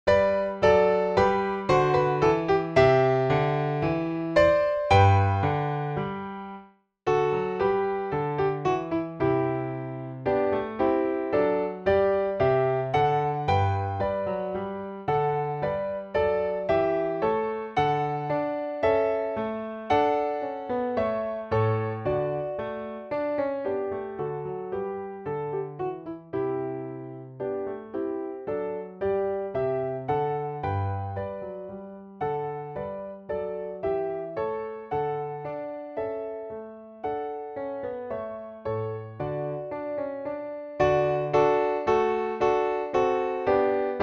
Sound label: Piano Accomp Concert G; Bourree